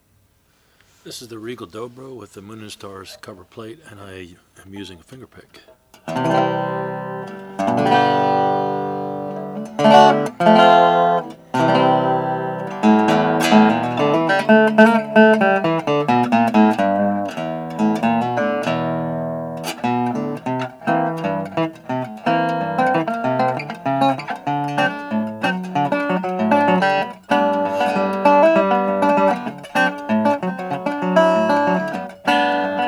regal dobro sunburst

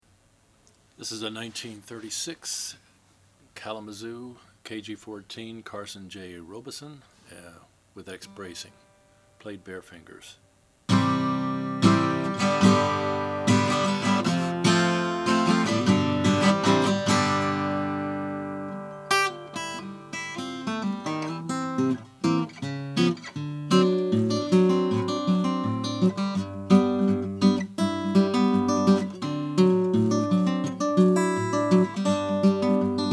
kalamazoo kg14 sunburst; 1936

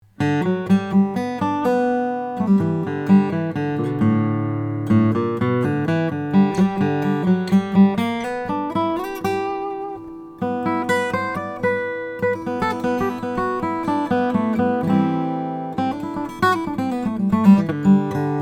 beneteau model m macassar ebony

